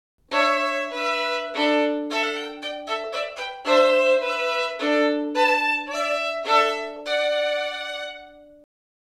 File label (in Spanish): Sonido de violin en cuerdas multiples; Instrumentos de cuerda; Cuerda frotada; Sonoridades especiales